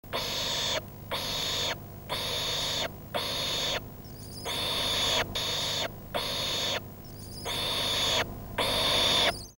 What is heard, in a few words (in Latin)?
tyto multipunctata